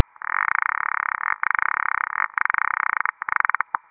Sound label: Masculinized Female Ad call